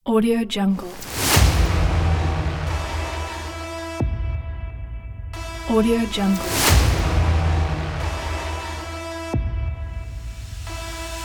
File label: horror trailer ident; commercialmusicstudios